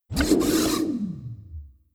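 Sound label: construction progress, ui; Stellaris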